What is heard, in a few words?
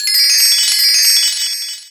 Yamaha EX-5